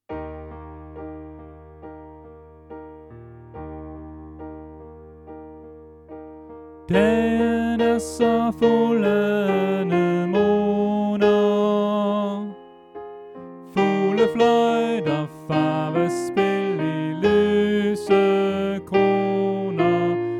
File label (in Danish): Sopran